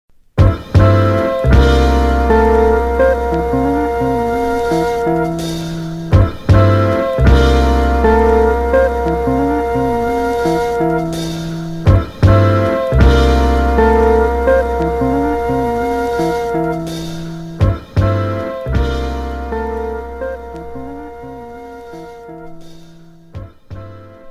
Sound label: Instrumental